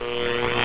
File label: viper mine sizzle